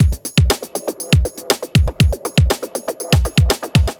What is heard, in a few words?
drums